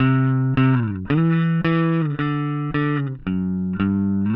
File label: Bass, Indie Rock; Session 01 - Brighton; Sonic Foundry (Sony Creative Software); Indie Rock Anthems